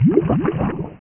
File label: Attack (Water Elemental); Dungeon Master and Chaos Strikes Back; Amiga